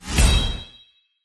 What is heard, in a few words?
shield pickup; Skillzgaming; mega Money Rush Desktop